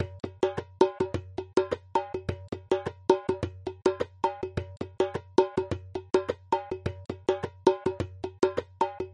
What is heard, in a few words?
DJEMBE 105BPM, percussion loop; bastaki